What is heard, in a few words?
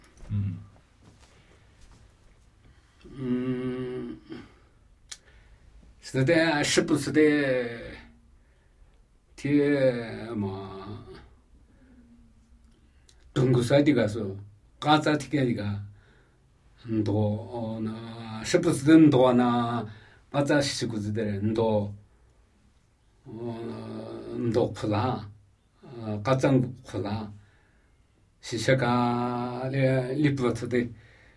Namuyi Tibetan Ritual Chanting